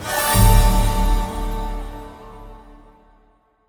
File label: splash wild intro; netent